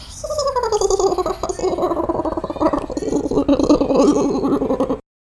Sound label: Demon Laugh